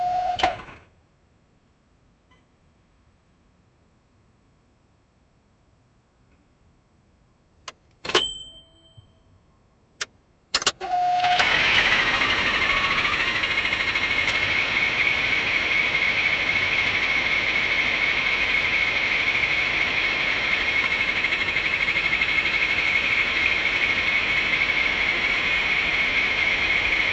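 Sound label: repeated attempts to start, internal combustion engine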